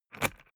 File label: briefcase plastic latch lock key insert ringing; industrie wirtschaft; bueroarbeit